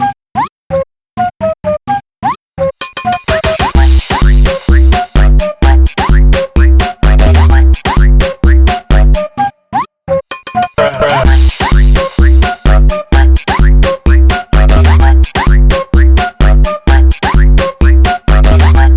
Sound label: instrumental